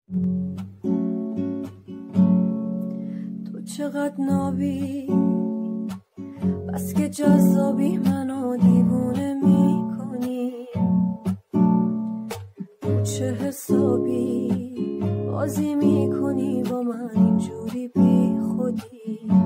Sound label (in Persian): اهنگ با صدای زن